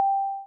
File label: new turn; Oryx